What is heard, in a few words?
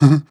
breath short, zombie